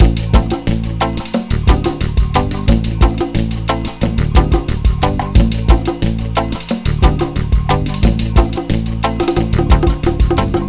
BAR MUSIC

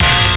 rock it stab

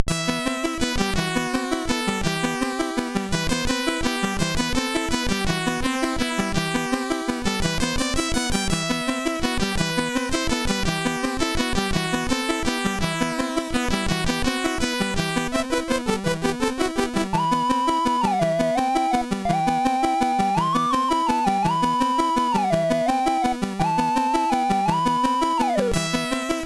Classical

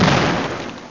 explosion; LegoBlast

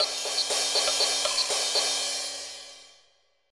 techno

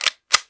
Machine Gun Reload; 1944 Battle Of The Bulge

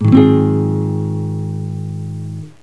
Guit Hit; HyperStudio Demo

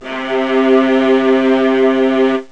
viola

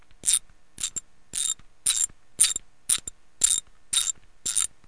socket

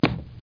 splats

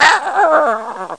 groan; Ferazel's Wand Demo; Ferazel Demo Sounds

